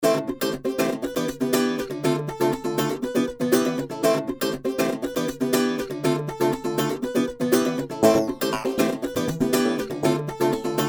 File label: Guitar; Pete Ippel; More guitar licks